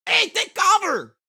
town human far; Blind Drive

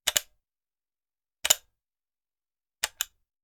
Machine Gun Foley; Sound Ideas; Guns

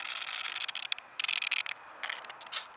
Rustle Leaf Blow Across Ground; SFX Kit; Foley - Rustle

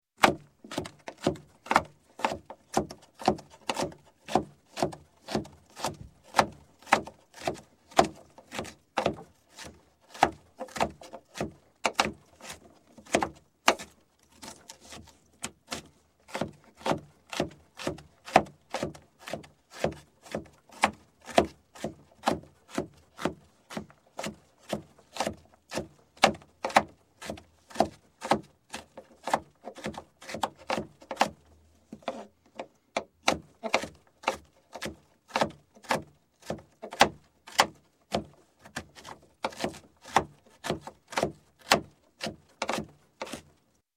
Cutting, Knife Mincing Vegetables, Food; Sound Ideas 2000 Series

